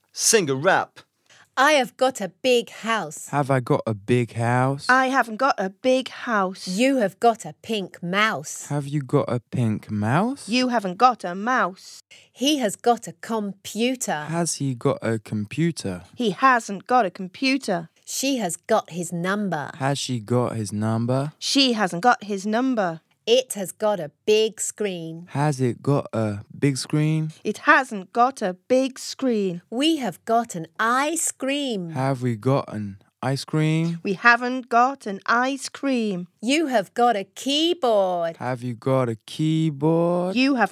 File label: Rap song